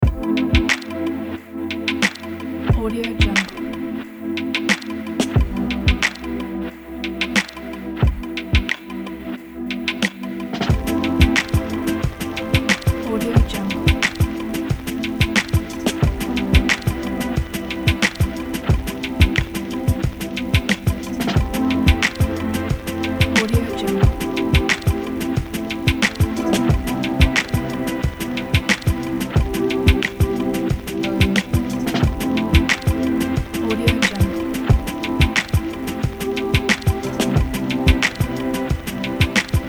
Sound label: ambient chillout hip hop